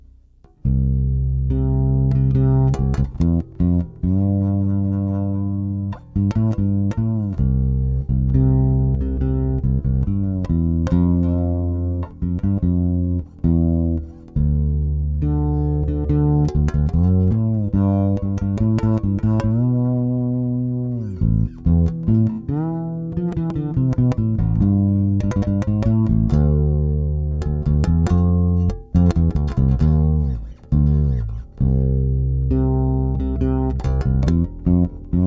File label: Q Tuner Neck, pickups